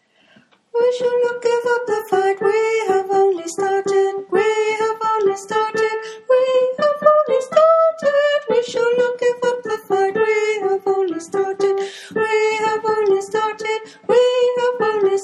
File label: We Shall Not Give Up soprano